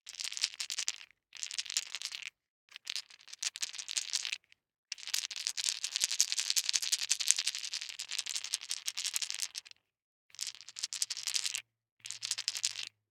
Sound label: DICE in One Hand, Shake, 6 Six Dice; Samples; ArticulatedSounds; DICE Sfx Party (Extended)